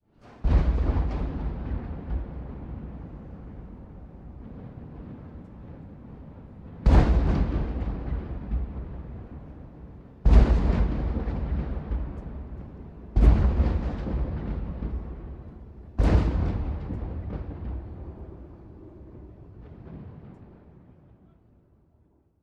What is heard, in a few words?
FIREWORKS Slapbacks; Neumann KMR81+SD702; Bombay Sonic